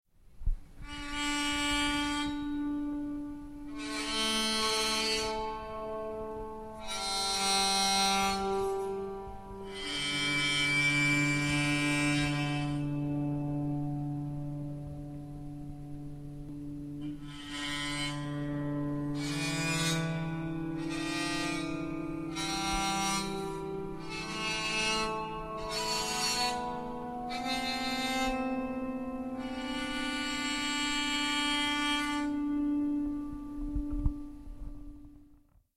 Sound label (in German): Steichpsalter